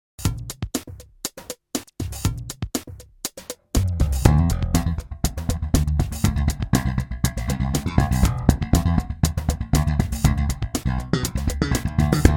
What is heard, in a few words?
Double thumb bass groove in E